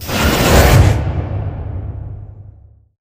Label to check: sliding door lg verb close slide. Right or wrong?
Right